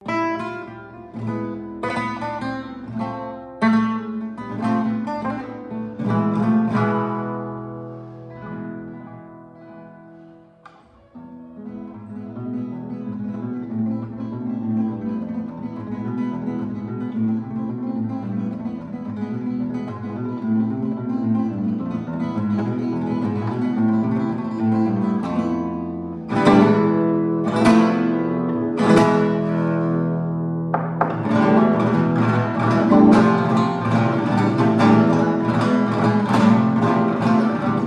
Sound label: Flamenco